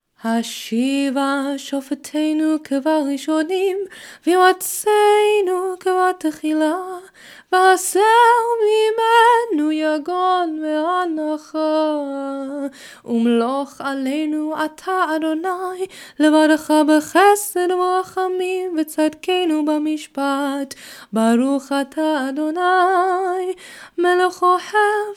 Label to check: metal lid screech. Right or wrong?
Wrong